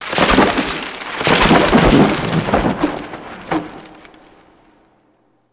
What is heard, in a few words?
Bird Trap Loop; Myst III Exile